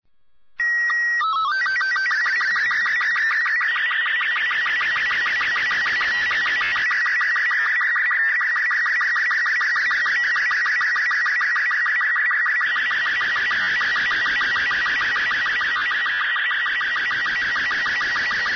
ambient; Portal 2; Source Engine; video games

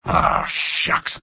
negative vocalization; engineer; video games; source engine; tf; mvm

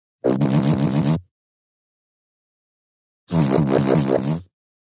fart, cartoon, low buzz fart

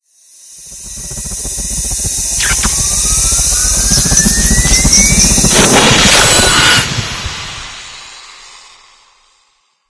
sticky bomb creator, strider buster; weapons; source engine; ep2

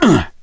melee swing, gambler, survivor voice

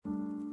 crying man; video games; Source Engine

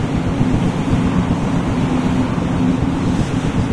tube suction; Source Engine; Portal 2

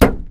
plastic box impact hard

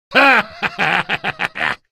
mad laugh; Source Engine; video game; ravenholm

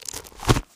taunt luxury lounge chair book close; video games; source engine; tf

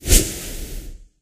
freeze cam; Source Engine; cstrike

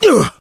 melee swing, player survivor voice

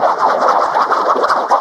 tongue fly loop; smoker; attack; source engine; left 4 dead 2

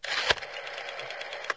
exclamation, windows sound schemes; mystery; windows 9x

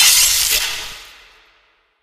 spark, coop electric; Portal 2; Source Engine; video games; coop bot

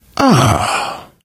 pain relief sigh, survivor voice; source engine; left 4 dead 2

